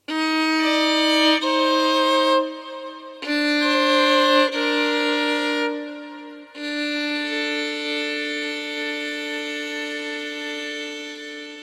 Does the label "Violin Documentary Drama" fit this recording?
Yes